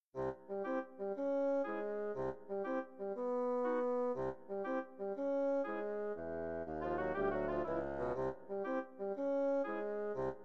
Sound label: duck